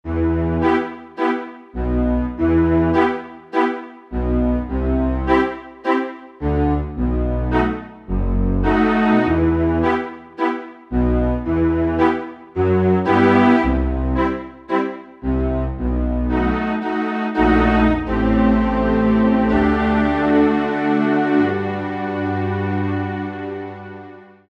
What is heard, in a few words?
WIDE STRINGS, Roland SC-880; Factory Patches